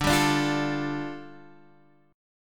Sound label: G 7th Suspended 2nd, D, guitar chord